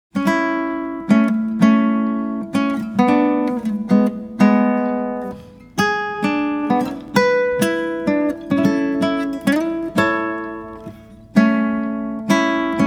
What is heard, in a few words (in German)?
Walzer Landler Walzer Playback ohne Begleitung